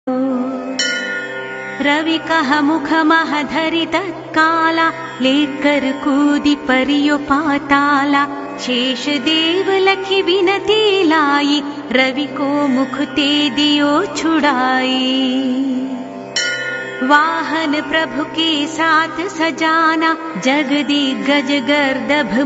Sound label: shani chalisa; deities